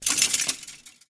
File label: chainlink rattle; scripted sequences, a2